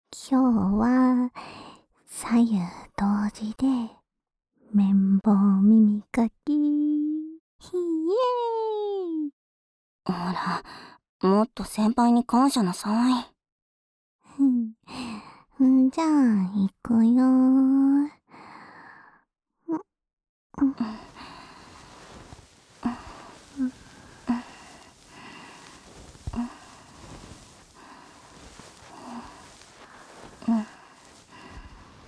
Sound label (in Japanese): 綿棒耳かき／二人同時（左右逆）